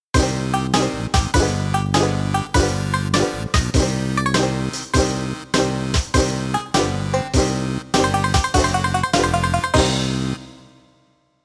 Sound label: Game Over; Banjo-Kazooie